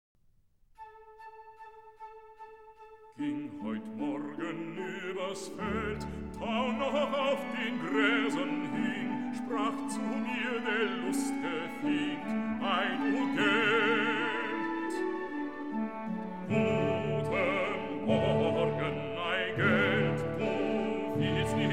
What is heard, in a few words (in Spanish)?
baritono, voces graves